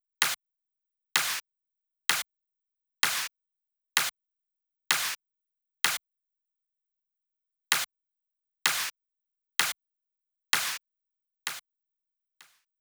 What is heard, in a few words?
Drum Loop Clap Add, 128BPM; VEC5 Drumloops; VEC5 Multi Loops 128BPM; Loop Elements